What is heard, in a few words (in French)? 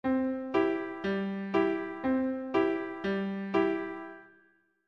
mini pompes do majeur